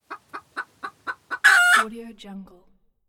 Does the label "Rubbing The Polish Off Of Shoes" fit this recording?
No